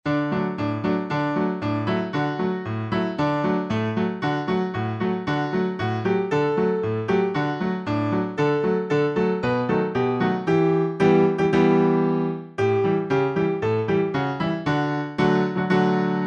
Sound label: j'ai perdu le do de ma clarinette instr; French